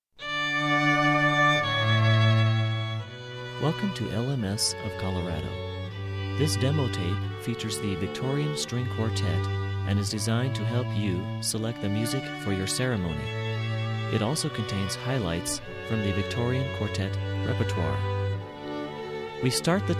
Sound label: Canon in D, classical quartet; Classical String Quartet